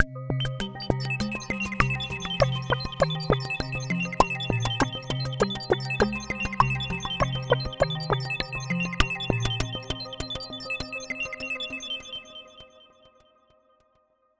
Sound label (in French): jingle